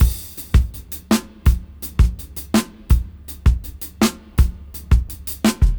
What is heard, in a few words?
Funkragga 82 BPM; AMG - Keith LeBlanc Phat Butt Jams